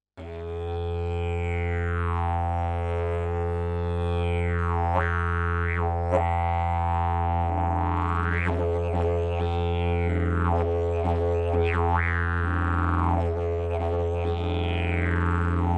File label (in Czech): jilm koren, didgeridoo